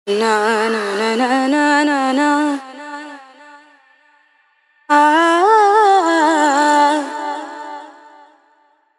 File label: Rebab Jam Vocals 99bpm